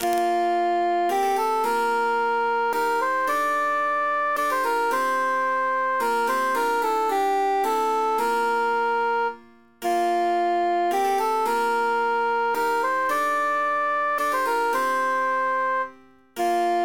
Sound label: cantus emphasized; fi maris